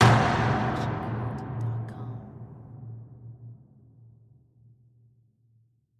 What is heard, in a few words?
designer medium and hi hit; SoundsCrate